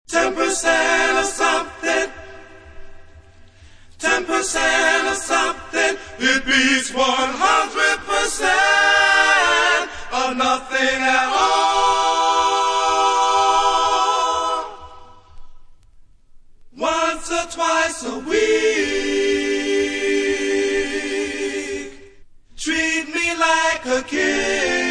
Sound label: original background vocals